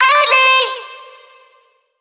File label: Omnivox oneshot C, Vocal; One Shots; Slate Digital Omnivox Vocal Sample Pack